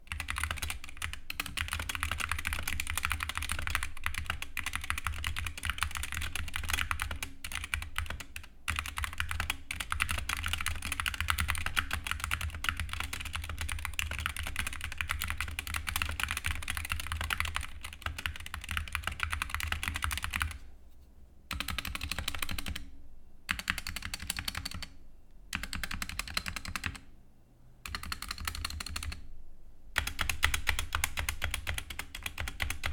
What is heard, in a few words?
SteelSeries Apex Pro Mini Wireless soundtest